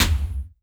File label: Elektron Monomachine, Drum Machines